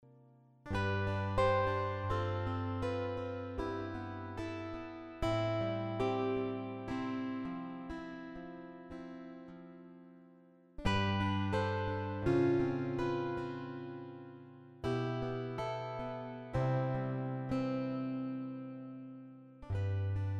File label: game over guitar variation